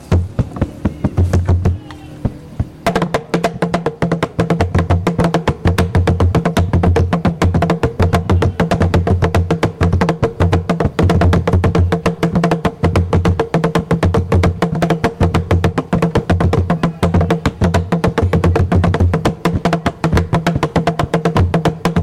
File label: two double drum patterns, Kilembe Uganda